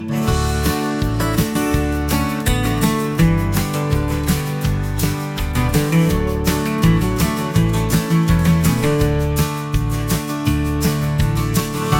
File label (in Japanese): アコースティックギター不気味